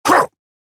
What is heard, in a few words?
Grunt Jump; Shaman